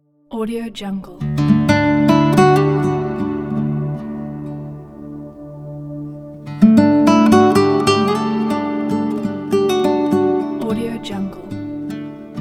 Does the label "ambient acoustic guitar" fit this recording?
Yes